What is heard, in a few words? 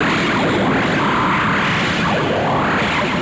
twitch beam; 2017 ag crossfire